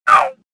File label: pain; Star Wars Jedi Knight II Jedi Outcast; XBOX; stofficer